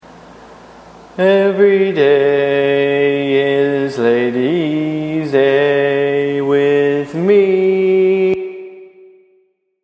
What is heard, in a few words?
Bass, barbershop tags